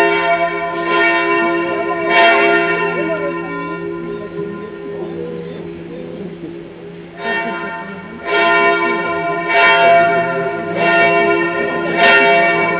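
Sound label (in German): Mittagsgeläut; Kufstein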